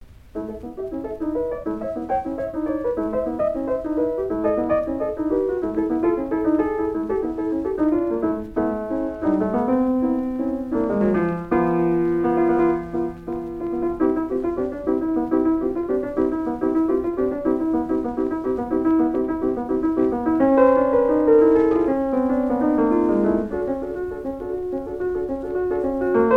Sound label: Dance in Bulgarian Rhythm; Book VI; Béla Bartók; Mikrokosmos, Sz. 107